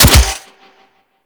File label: Bow Shot; Warframe; Weapons; Tenno; Daikyu Bow